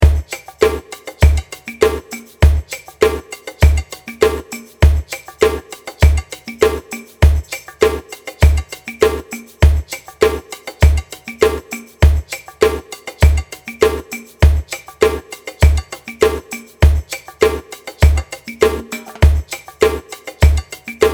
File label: DRUMS